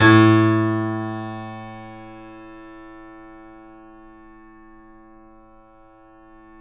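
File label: piano, A, left; InVision Interactive - The Piano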